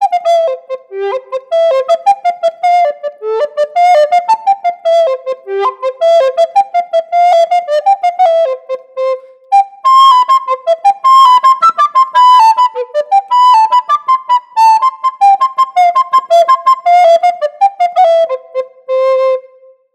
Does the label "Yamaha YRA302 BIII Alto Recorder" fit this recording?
Yes